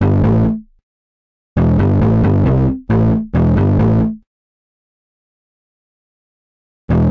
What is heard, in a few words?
Bass; Suicide Bass; Ableton; Breakbeats by KutMasta Kurt